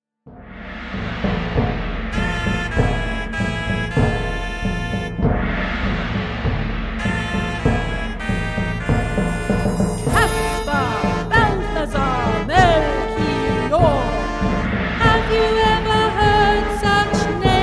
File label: Fanfare